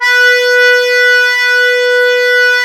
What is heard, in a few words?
DIATO2 B2, Historical Instruments; USB Soundscan vol.23